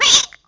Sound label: bird collision; Angry Birds Space